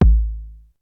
huge steel kick drum a key

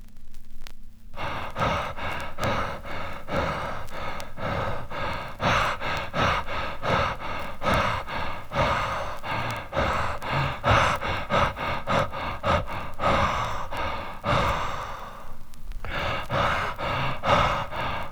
heavy breathing - male; Equipment used: TTA05USB akai player and focusrite 8i8 interface, using an SSL limiter; Recorded from Sound Effects - Death and Horror rare BBC records and tapes

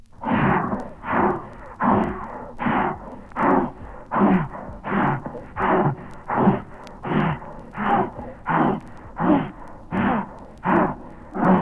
the hell hound panting

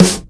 Crisp Urban Steel Snare Drum Sample F# Key

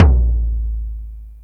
short reverb tom sample a key; tom drum

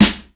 perfect snare drum sound g sharp

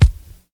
classic punk kick drum sample c sharp key

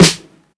prominent snare drum sample E key; snare drum samples

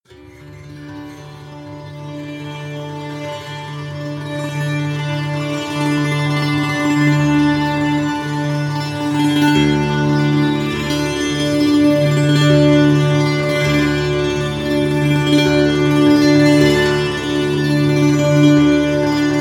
therapy monochord

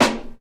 round funk snare sound e key

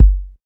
kick drum single shot g key

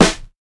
good acoustic snare sound d sharp key

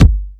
dry steel kick drum sound g key

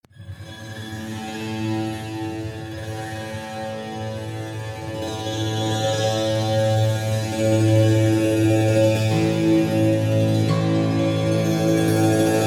kotamo monotone sound; loops

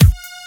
trebly kick sample f key

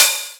hot open hi hat sample d key

